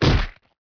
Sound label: gen hit; ZEUS